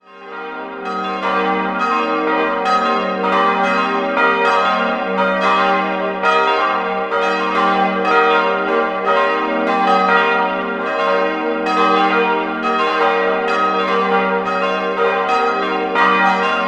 Bayreuth Laineck, glocken, Pfarrkirche St. Johannes Nepomuk; Idealquartett g'-b'-c''-es''; 1964; in Passau gegossen; Rudolf Perner

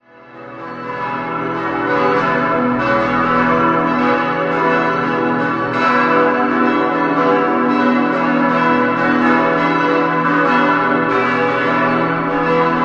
Weilheim, glocken

